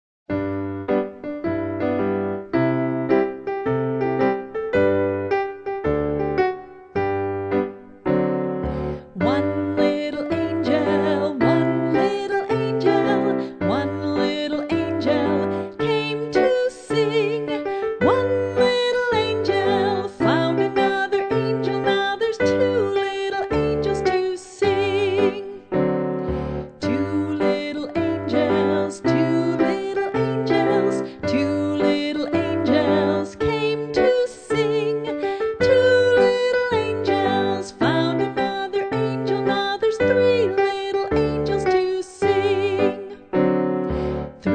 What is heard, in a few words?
one little angel vocal